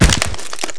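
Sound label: body fall; The Elder Scrolls III - Morrowind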